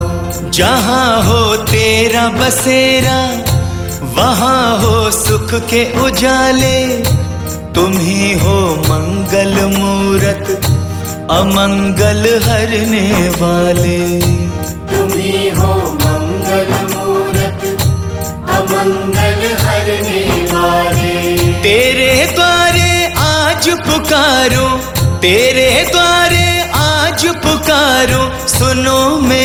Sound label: Ringtone